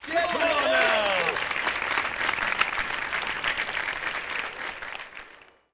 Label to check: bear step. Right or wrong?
Wrong